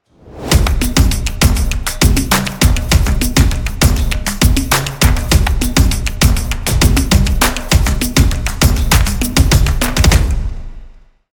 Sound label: Ethno percussions